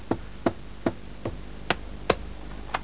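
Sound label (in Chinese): ma jiaosheng, horse neighing; jiachu jiaosheng; jiaqing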